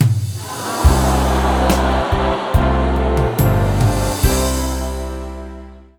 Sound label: Wrong; Dinox; Wii U